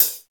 Sparkly Hat One Shot A Key, Royality free closed high hat sample tuned to the A note; hi-hat